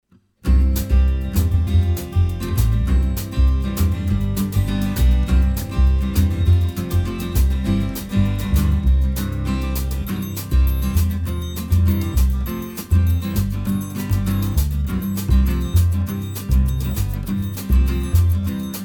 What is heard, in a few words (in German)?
Karaoke Alle Voegel sind schon da, kinderlieder; Alle Vögel sind schon da